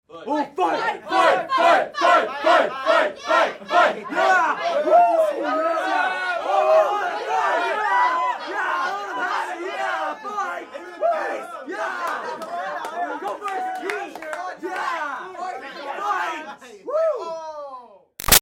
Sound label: Crowd Teens Chant Fight Reactions; Crowds